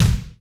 Kick, Yamaha RY20

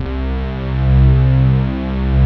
SYNTHETICS, pads; Pro-Rec - Synthsonic; TR PADS

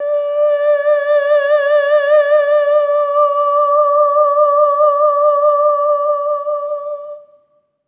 EH OH D5, SOP; Spectrasonics; Symphony of Voices

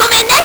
LSDj, Nintendo Gameboy; 300 Drum Machines

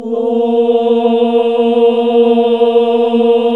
OHH A, Nuns Ooohh; Sound & Vision - Gigapack II CD 1 (Roland); HAL NUNS AAH OOH

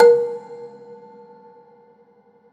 Rubbery Piano, prepared piano; Total Piano

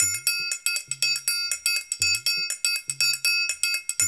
AFRO, African Contemporary, AfroTriangle

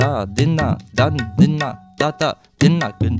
India, Heart of Asia; Spectrasonics - Heart of Asia CD2

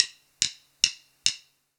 Drum Sticks, Drums Live Kit; neuro; Stanza

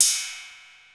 CYMBAL; Crypton - DrumBase5000

